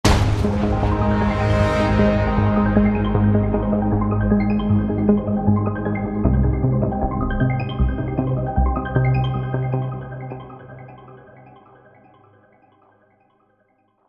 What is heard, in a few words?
Music respawn; Elemental Fury